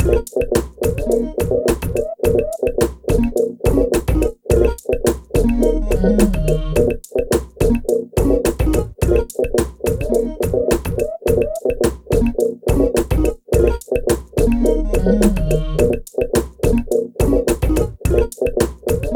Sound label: BEAT, Beats 105-150bpm